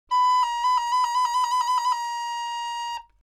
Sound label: AirReed Record; 気鳴楽器; Recorder